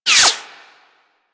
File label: Bullet Whizzby; Warframe; Weapons